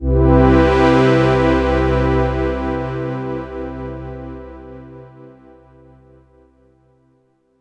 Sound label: LITE PAD MAJ, Synths